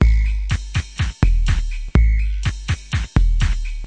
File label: 124 bpm two kick whistle beat